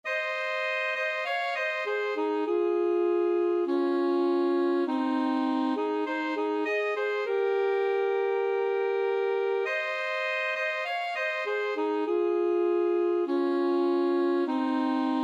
alto saxophone duet

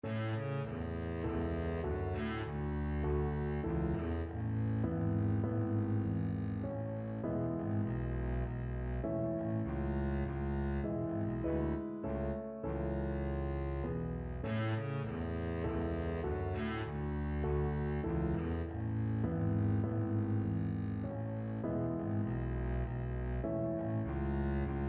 mozart minuet, Double Bass

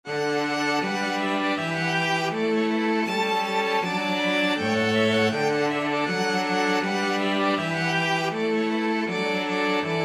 gavotte cello suite 6, string quartet; bach

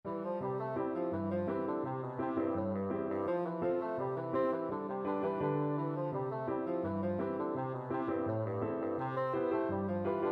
autumn woods, bassoon

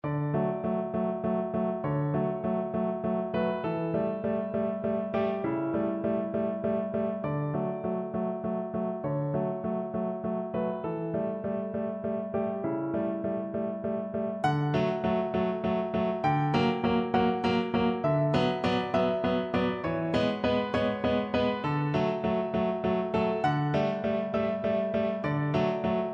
overture, piano

